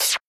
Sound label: cymbal